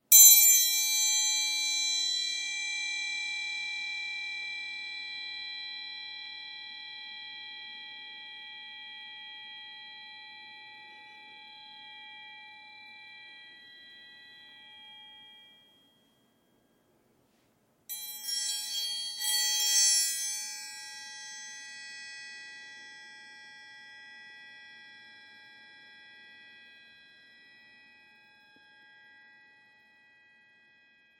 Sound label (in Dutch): Triangel Brons Middel Gehamerd